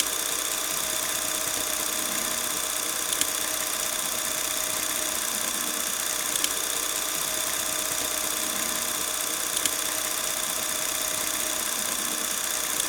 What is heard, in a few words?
Cine Camera 16fps